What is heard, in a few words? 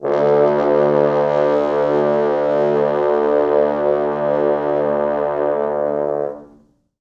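4 FrHorns SUS, French Horns; East West; Quantum Leap Horns Sax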